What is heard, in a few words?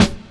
bassy acoustic snare d key; snare drum samples